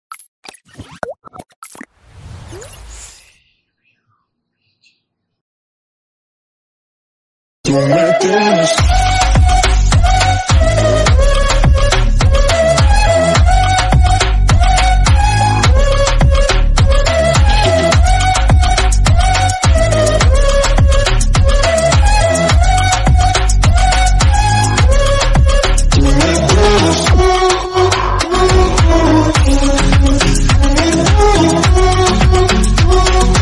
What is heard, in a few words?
remix attitude ringtone attitude music background music